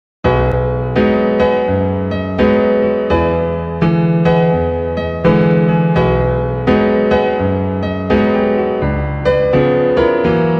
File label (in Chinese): Jazz